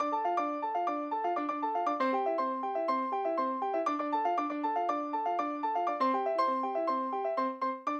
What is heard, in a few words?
Acoustic Piano, Loops